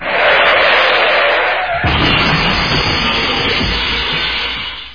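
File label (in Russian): резкое торможение шин и сильный грохот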